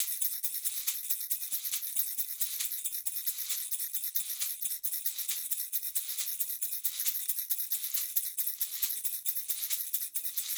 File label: Small Shaker Mix; Warrior (Tohoqashaw)